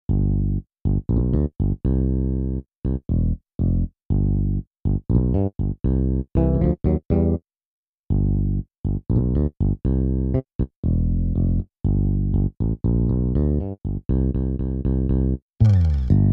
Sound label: Megavoice Bass